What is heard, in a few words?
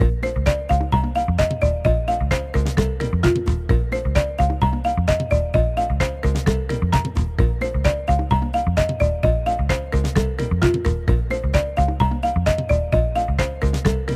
BGM Pop; Touhou Kobuto V Burst Battle; ps4